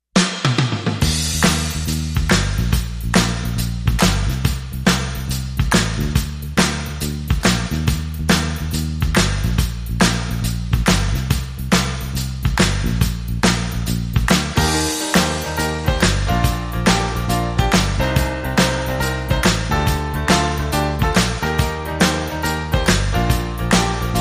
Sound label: No Guitars No Horns Mix